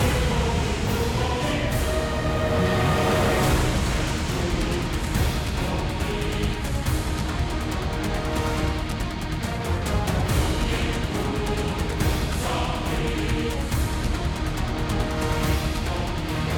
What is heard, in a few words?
Battle Boss Phase; Baruka Phase 02